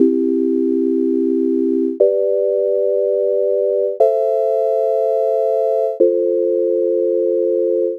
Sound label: chords basic